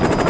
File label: helicopter, rumble; golgotha